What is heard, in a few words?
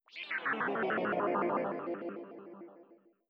wobble